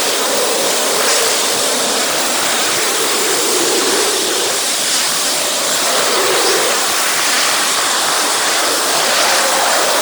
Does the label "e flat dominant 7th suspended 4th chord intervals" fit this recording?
No